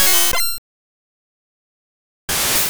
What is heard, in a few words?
Metal Claw; Pokemon Essentials; PKMN Estelar 1.3